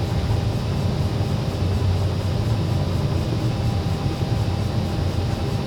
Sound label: steam turbine; Factorio